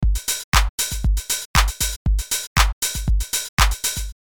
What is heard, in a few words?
busier beat